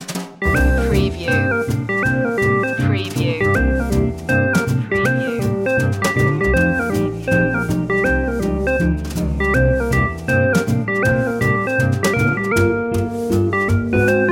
kids blues

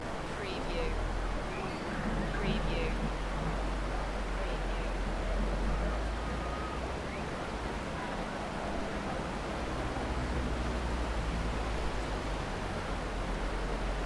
Spanish promenade in the rain; beanstalk audio

